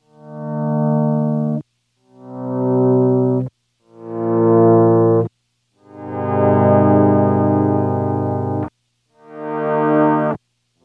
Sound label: Fade In VCA; guitar synth